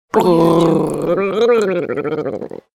female washing throat

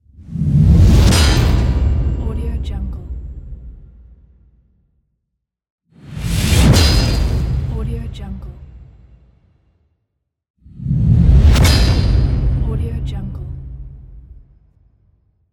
whoosh metal hit

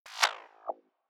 crossbow arrow flyby; future weapons 3; crossbow arrow type 4; soundmorph

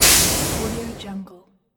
a train steam

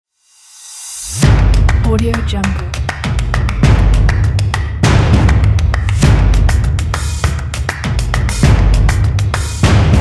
street tribal logo

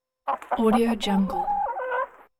chicken

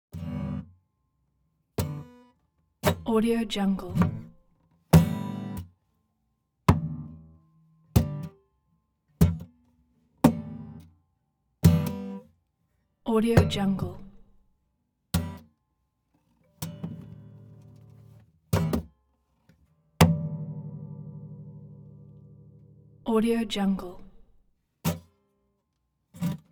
guitar abuse

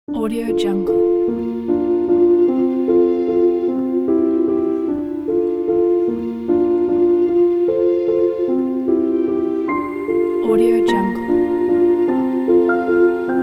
inspiring and classical wedding waltz

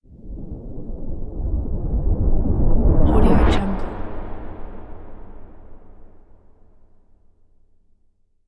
static rush big boomy; prosoundfx

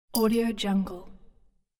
gentle bell touch fall

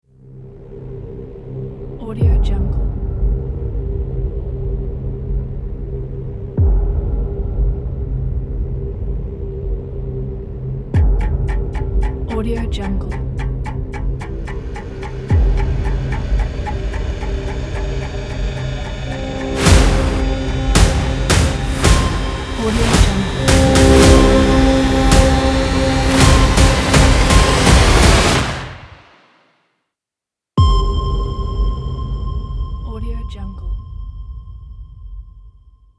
cinematic trailer

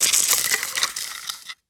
dry ice sparks

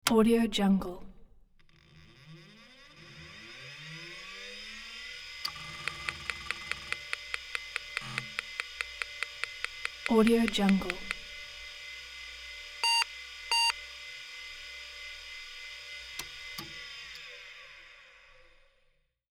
computer pc startup and abort

